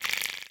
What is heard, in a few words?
slobber, clams; infestation